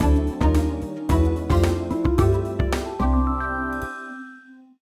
Jingle Collect Rainbow

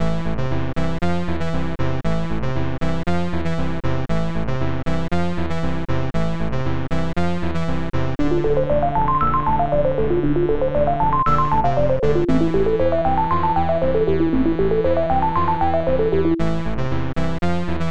Creepy; SM Choukyoushi Hitomi Bangai Hen; SNES

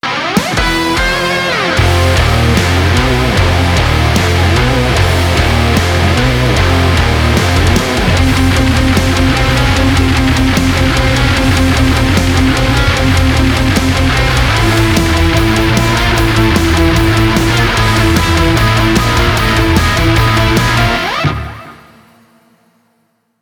Chill Clear; Lame Genie Presents Dr. Mario

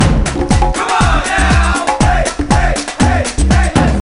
Uh Oh; gamerip; Happy Feet Two; nds

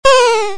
Stalac fall Snd; gamerip; Robot Wants Puppy

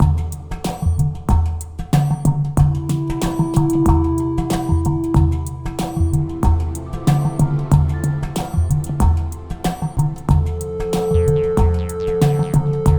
Waves; Shortest Trip to Earth

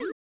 Cancel; Super Pachinko SNES

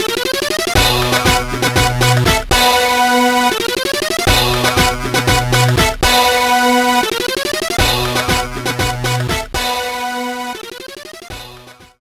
Bowling - Challenge Clear; Super Monkey Ball Jr.; GBA

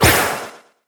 windup; Flower windup; Fight Knight

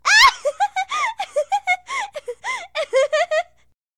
Tickle; Kitchen; Hilzartov; Fairy Size Doesn't Matter

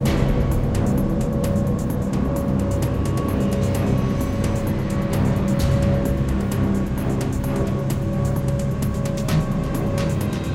elevator tension; Metal Gear Solid; Twin Snakes

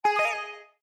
Exclamation; Raga

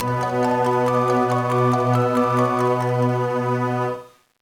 Unknown Jingle; Golden Sun: The Lost Age

